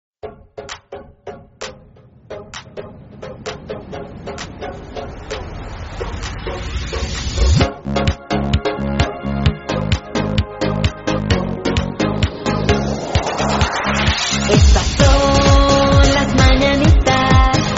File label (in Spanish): Las Mañanitas, Fiesta Infantil, Música para niños